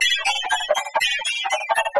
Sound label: 120BPM SWING; Bolder Sounds - Granular